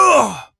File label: player hurt